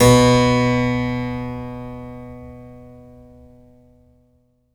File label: harpsi B2; HPSCHD 4